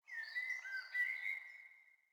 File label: sting songbirds; Rust